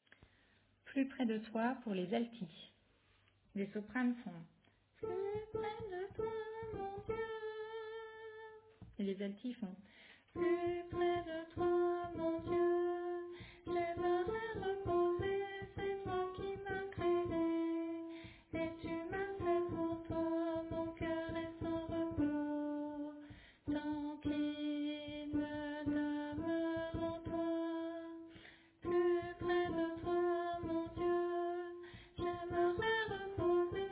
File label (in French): Alto